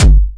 Syn Dr, Overloaded Synthetic Drums; Hip Hop; STYLE KITS (Hip Hop, Etc)

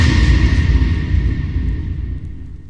Electro FX; Ueb HE Electro FX

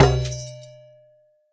Processed Ethnic Percussion; Doru Malaia Percussion

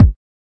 kick

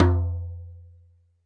classic hip hop Bng; STYLE KITS (Hip Hop, Etc); Classic Hip Hop Mega Kit

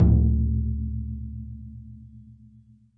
hirado, Japanese Drums; ETHNIC and WORLD PERCUSSION

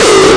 obnoxious kick

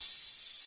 Hat Cymbal, Hip Hop; drums; STYLE KITS (Hip Hop, Etc); Khezie Hip Hop Kit

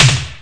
sp12, EMU SP 12; DRUM MACHINES; Kult

